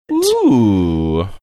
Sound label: "ooooh"